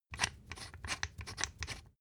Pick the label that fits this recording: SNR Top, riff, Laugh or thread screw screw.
thread screw screw